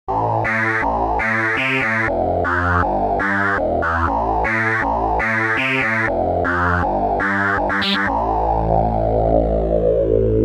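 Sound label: casio fz-1 sounds, 8x saw with full resonance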